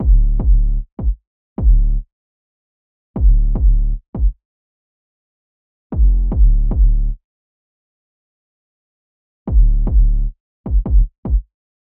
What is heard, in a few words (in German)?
808 nachher; EQ Tipps für Beats; 5 EQ Tipps für Beats